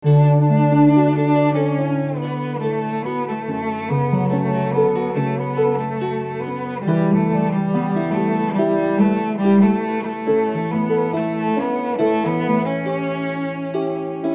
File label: cello